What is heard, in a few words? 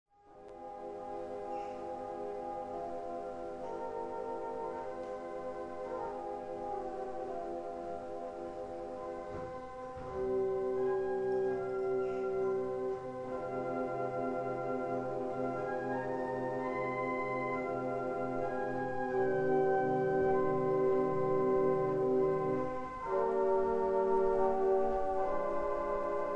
voix celest dulciana